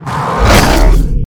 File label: pinky attack melee, monsters vocalizations; DoomRTX